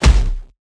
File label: Object Drop Hit